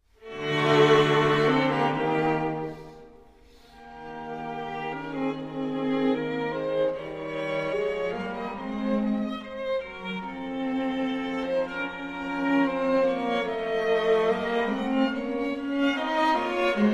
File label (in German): Streichtrio B-Dur; Schubert; D471